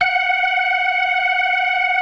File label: DRAWBAR VIB, Synth and Organ